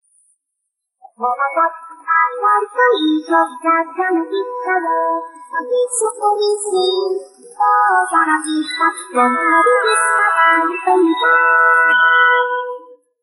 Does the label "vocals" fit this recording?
Yes